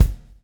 swing

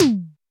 Roland U220 Electric Set, Drum Machines

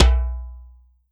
MIXED DRUMS

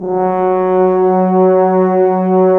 BRS TU, Tu & Cimb; Roland LA Composer vol. 4

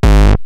Roland TR-909 BD; BD Selection

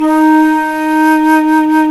FLT C FLT, C Flt Brt; Roland LCDP04 Orchestral Winds; 90 s Sample CDs; C Flutes 3-8

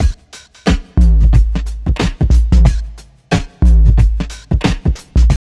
TRIP 90, trip loop

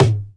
tom, dance drums; Best Service; Dance Mega Drums; TOMS

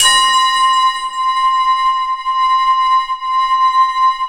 POWER BELL C6; Best Service Dream Experience; POW-BELL

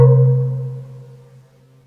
GAMELAN C2

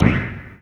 CYPUNK, DRUMS PERC; Q Up Arts; Dream Experience

